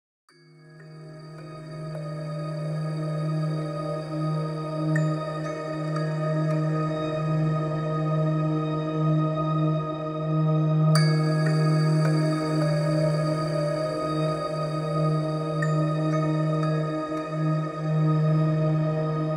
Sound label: BG MUSIC